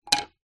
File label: foley wood small block thin light down on wood; zapsplat